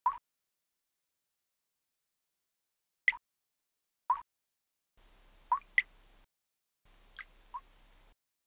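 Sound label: drip ambience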